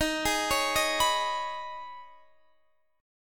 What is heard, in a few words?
Eb 7th Suspended 4th Sharp 5th, Guitar, Open D